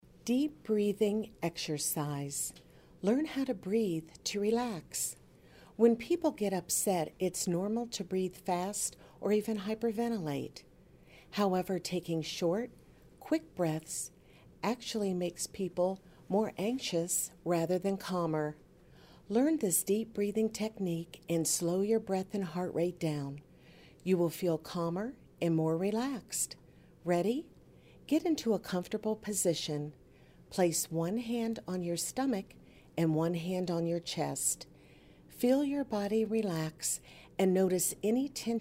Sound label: Deep Breathing